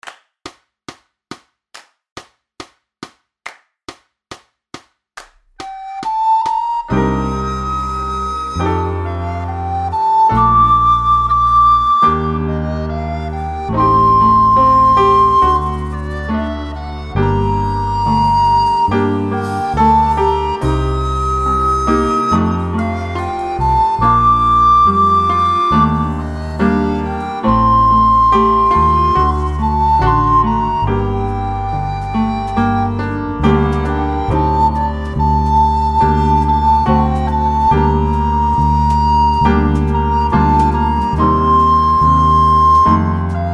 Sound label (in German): ohne Saxophon